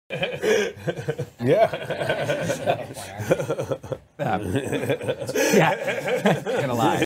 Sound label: laughing; seth rogen; at apple fruit